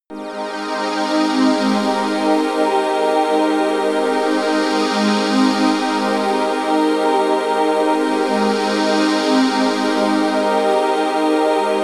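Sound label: chrystal air, pads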